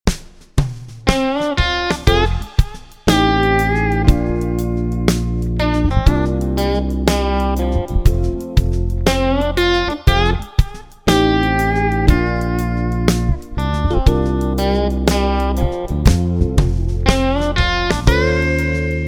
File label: Blues